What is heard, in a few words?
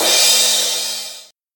Cymbal Drum Sample D# Key, Royality free single cymbal hit tuned to the D# note